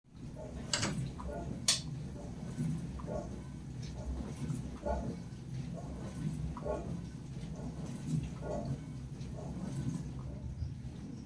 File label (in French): bruit lave vaisselle bosch normal